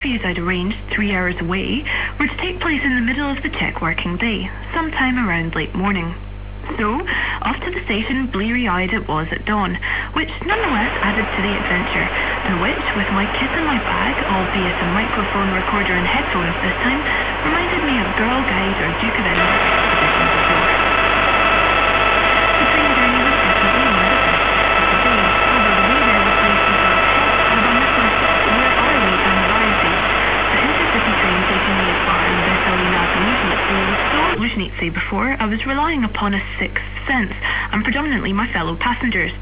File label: EMC PLT Radio 11MHz